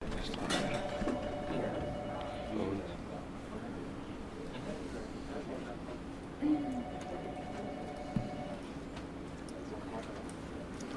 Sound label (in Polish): biuro